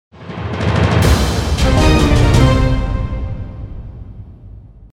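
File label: jingle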